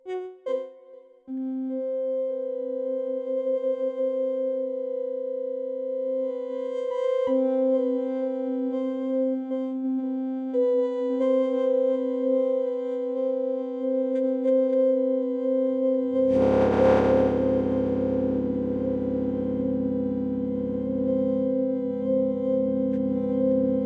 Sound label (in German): Striso board Organ Drone externer Hall